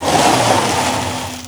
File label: skid low friction no start delay; junker